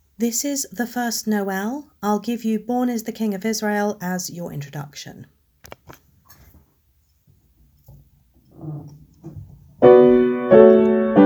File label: the first nowell 88 bpm, cello